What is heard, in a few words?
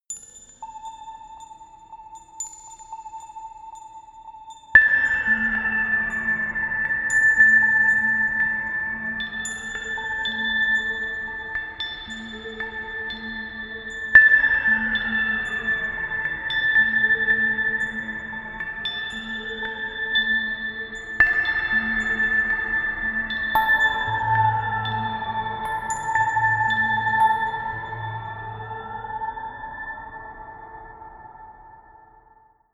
sequence pling pling pling